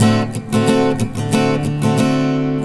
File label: Acoustic Guitars; ACID MUSIC; Breezer